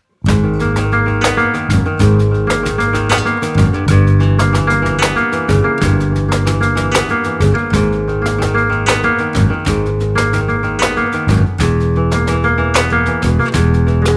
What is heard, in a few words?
World Band